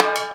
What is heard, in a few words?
STICK; InVision Interactive; Percussion Slam; CAFFEINE